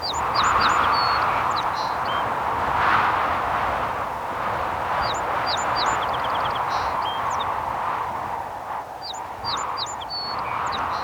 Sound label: Windy Ambient; Orphaned